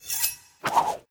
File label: Throw; Racaille